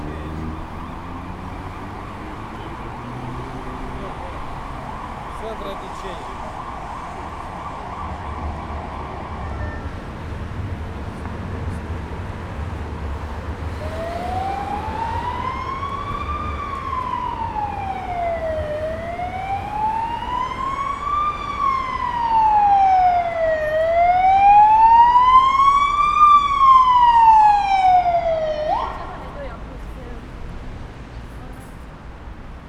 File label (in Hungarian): mentő, mentőautó; nyugati ter